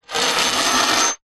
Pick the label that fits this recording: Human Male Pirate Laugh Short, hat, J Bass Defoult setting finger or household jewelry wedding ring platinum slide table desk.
household jewelry wedding ring platinum slide table desk